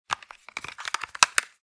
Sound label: Headphone adapter handling and movement; headphones and speakers